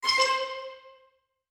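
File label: woodwind string xylophone two note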